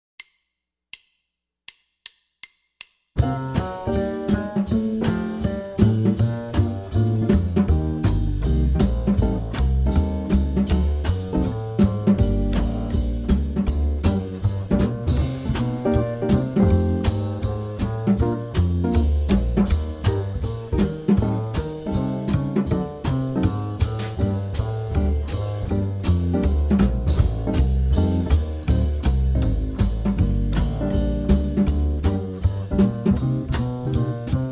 practice 160 BPM

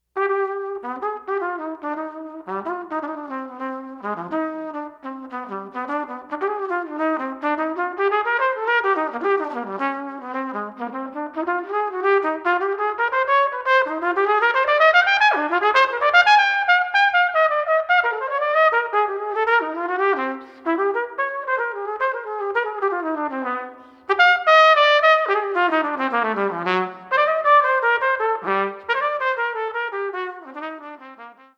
Jazz Courante

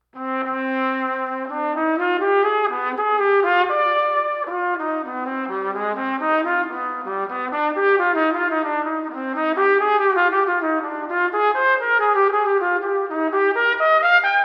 Classical Allemande

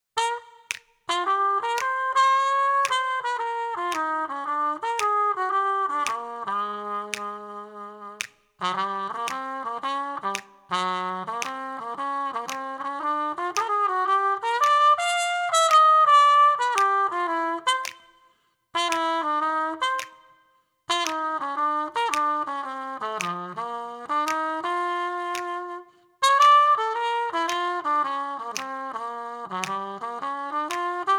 Blues

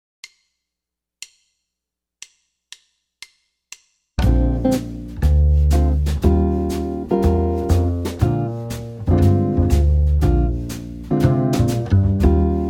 Chord Pattern

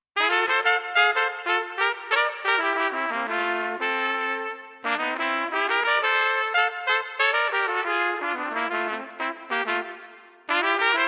Duet

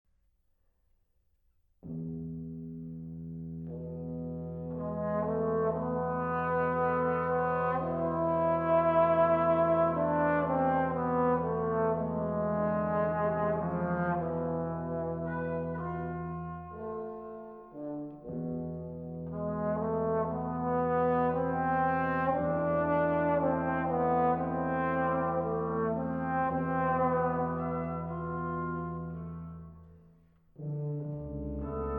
Sephardic Quintet

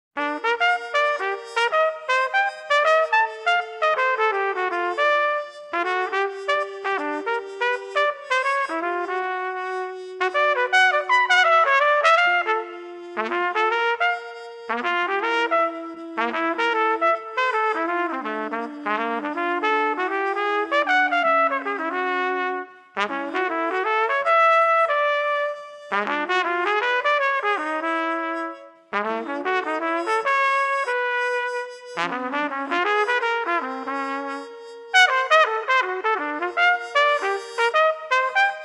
Jazz Etudes

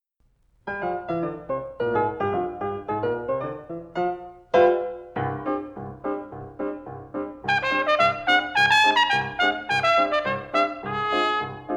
Trumpeters Cake Walk

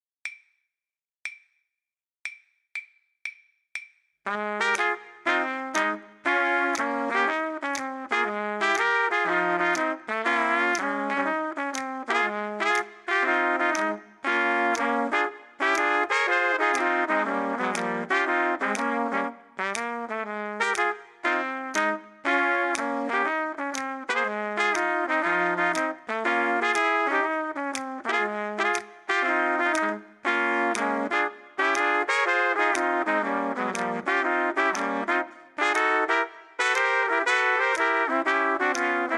Rhythm Changes Everything Minus 1

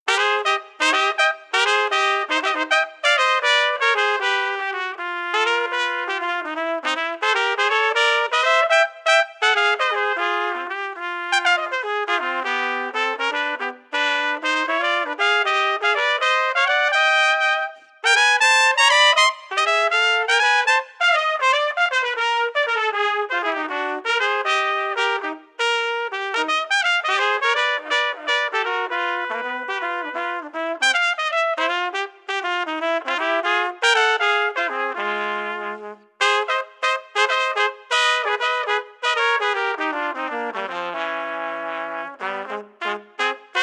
Jazzy Duet

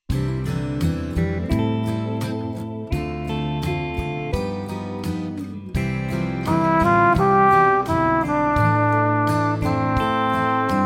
Flugelhorn

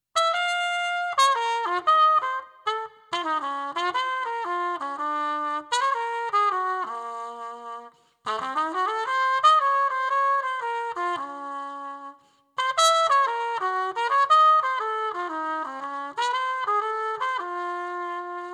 Blues

